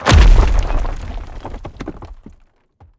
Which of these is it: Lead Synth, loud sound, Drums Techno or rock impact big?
rock impact big